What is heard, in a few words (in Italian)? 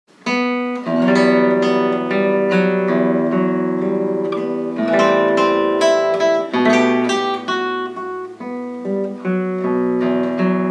Chitarra Sola